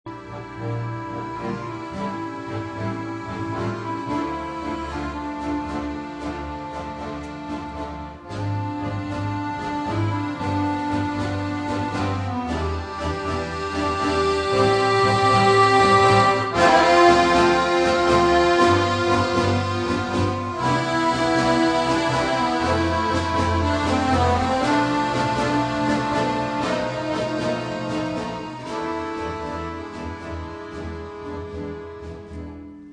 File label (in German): Hoerprobe, Akkordeonorchester